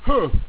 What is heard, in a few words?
shout